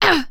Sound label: vocal pain human female grunt fight; rogue